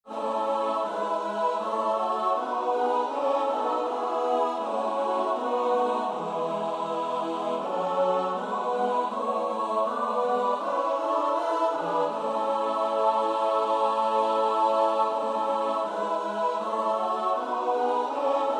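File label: soprano alto tenor bass; Bach (Johann Sebastiaan); BWV 030; 6 Eine Stimme lässt sich hören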